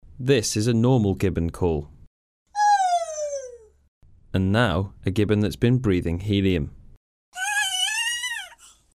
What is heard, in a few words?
gibbon helium; nature